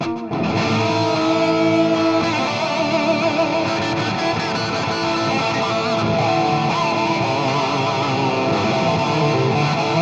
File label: JCM800 Rock